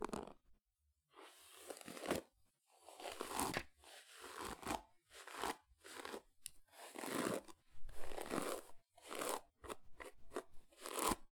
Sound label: Knotty Hair Being Brushed